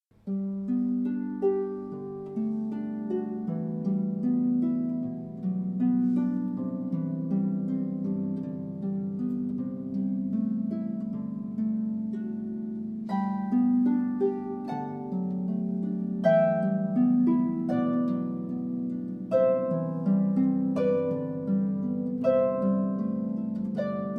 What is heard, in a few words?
Canon in D; Pachelbel; hochzeitsmusik wien